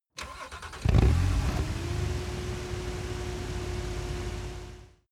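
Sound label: sports car exterior engine start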